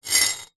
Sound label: industrial trowel metal short scrape on tiled ground